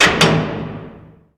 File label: Jail Door Lever Pull; jail & prison doors